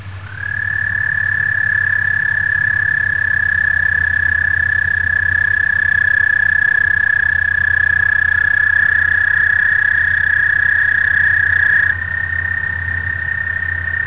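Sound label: american toad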